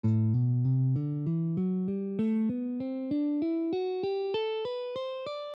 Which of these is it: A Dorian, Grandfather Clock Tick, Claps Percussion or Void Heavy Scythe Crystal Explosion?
A Dorian